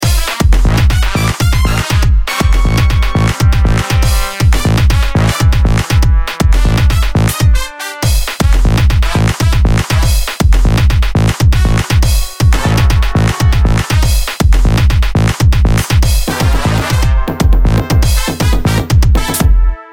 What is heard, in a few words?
Funky trumpet